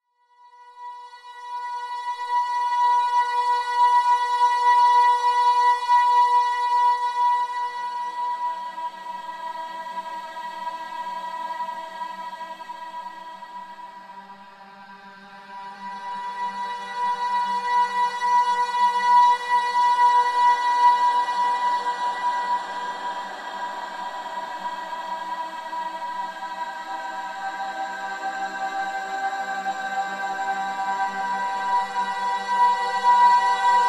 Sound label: rope spectral weave; SoundMagic Spectral; Michael Norris